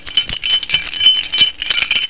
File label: jingling; Monster Media No. 2